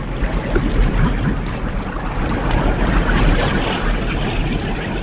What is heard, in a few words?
water, ambience; openffa